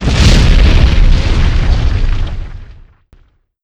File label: nuke; SuperPower 2 Redux V7A